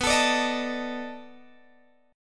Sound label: B 7th Sharp 9th, down, Guitar, Open G